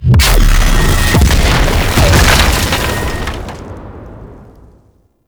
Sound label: Fire Beam; Warframe; The New War; Cinematics; Drifter Lotus Chase Intro